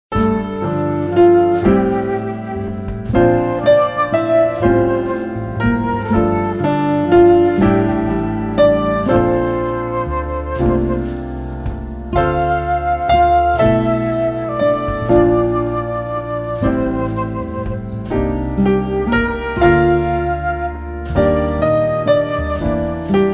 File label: easy listening